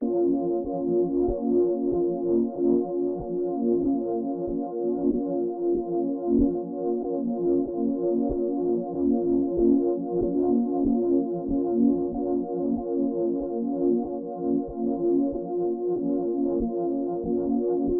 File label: 94 BPM A# Min Pad; Loop Stems; Cymatics; 2022 Melody Collection Platinum Expansion - Pt 2; Prophet